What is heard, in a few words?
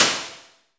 Cymbal